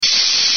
salida gas; equlibrio quimico

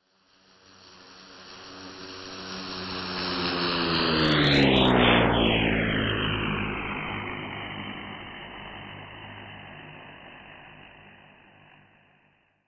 avioneta 400 kmph; Telesecundaria

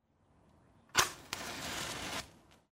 cerilla; la parte por el todo

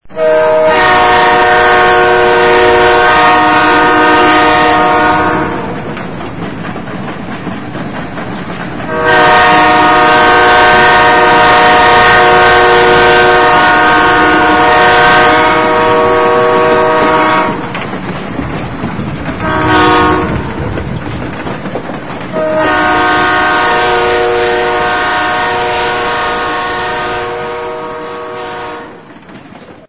DIESEL, locomotora; miscelanea/materiales didacticos/locomotora-JS

